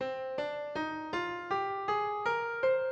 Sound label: do minor dizi